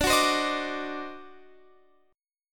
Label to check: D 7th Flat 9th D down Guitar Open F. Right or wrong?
Right